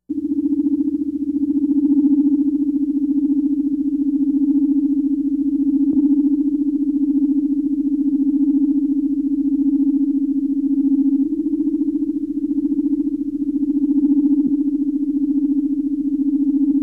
transporter room, background; TOS

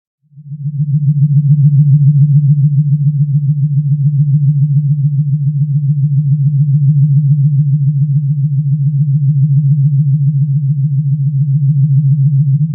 engineering, background; TOS

